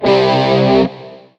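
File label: metal Short Power chord C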